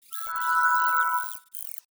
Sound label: ringin, Windows